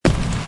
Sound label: fire spell